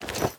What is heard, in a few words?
equip diamond, armor; Minecraft; neoLegacy